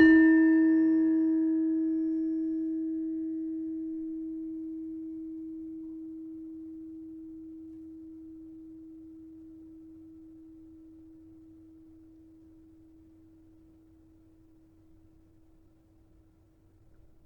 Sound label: gamelan tuned